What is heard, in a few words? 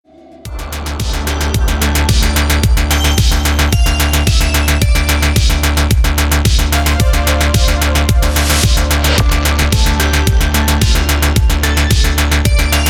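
electronic ringtone for phone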